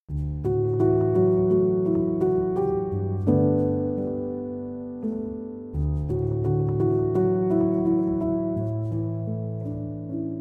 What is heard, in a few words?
Piano Instrumental